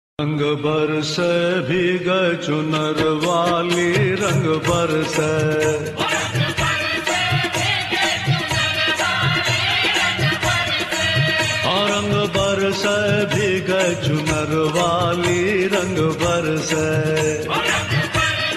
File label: Holi Ringtone